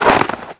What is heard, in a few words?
foot snow deep; footsteps